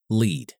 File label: lead